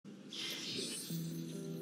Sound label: hand link opening